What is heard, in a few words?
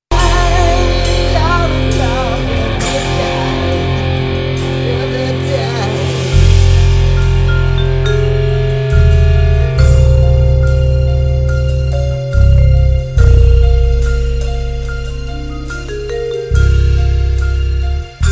Rock Band; Las Vegas